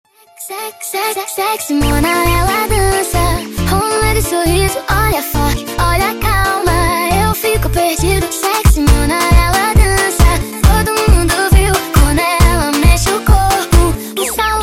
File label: Funk sped Up